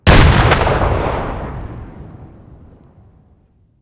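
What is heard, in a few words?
grenade explosion distant; Escape from Tarkov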